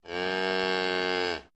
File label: Cow Moo